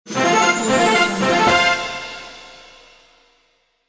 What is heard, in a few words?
win fanfare